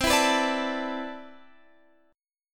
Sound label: C Major 13th, Guitar Open F, chord; CM13; strummed; x,19,16,18,17,16